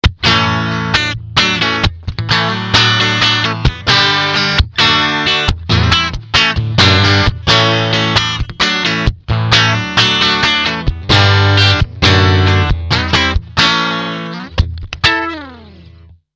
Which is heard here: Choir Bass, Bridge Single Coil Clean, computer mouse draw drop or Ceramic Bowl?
Bridge Single Coil Clean